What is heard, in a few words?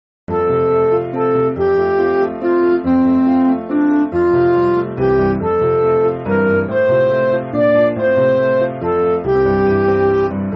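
Midi, Music Backing Files for Churches; Hover Oer Me; Fill Me Now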